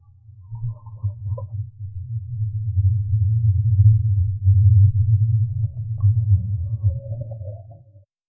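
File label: calm slow underwater ambience, whale calls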